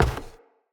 Step Wood, Footsteps; Enemy; Corrupted Sproutfolk

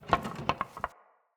Cage Rattle; Avakot Ode; Level Events; Midrath

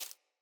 Rat Step, Foley

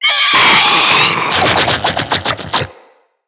Call; Infested; Diseased Ancient

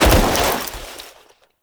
Foley Roll; Warframe; Characters; Kahl

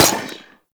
Rifle Fire; Warframe; Venus; Venus Hyena Astro; Weapons

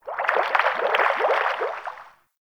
Fish Tank Movement; Warframe; Hub; Liset Personal Quarters

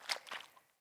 Boot Walk Water, Footsteps; Characters; Enemy; Boots; Sinecure; Melee Grunt

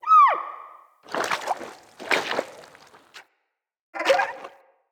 Stuck Fawn; Encounters; Animal Rescue

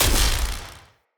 Cannon Tesla Shot; Weapons; Player

